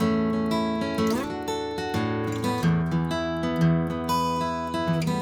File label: Guitar ADE, Acoustic